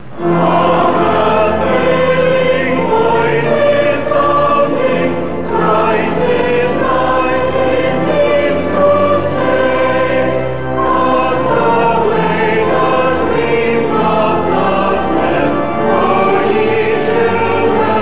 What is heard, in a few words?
choir